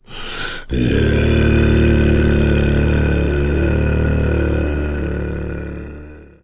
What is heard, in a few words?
single chant; hl2 npc; ep2